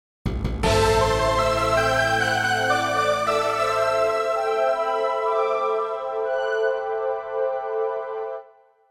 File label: Gameover